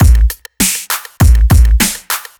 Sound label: Drum loops, Electro